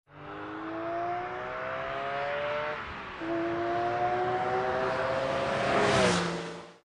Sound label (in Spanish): coche acelerando